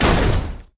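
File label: engine give up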